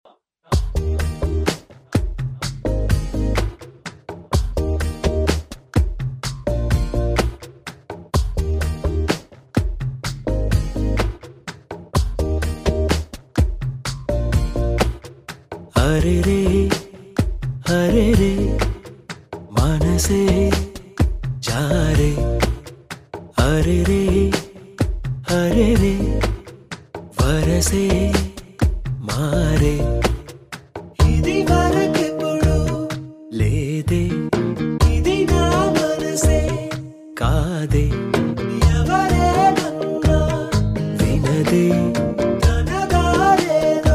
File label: With Music